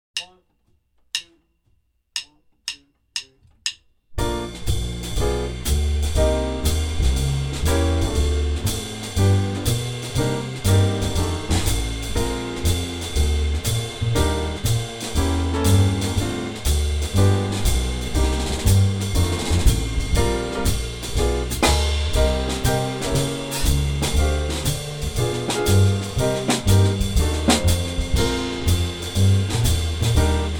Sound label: Rhythm Changes in Bb playalong; TMEA; Jazz Mid-State Bb Rhythm Changes Track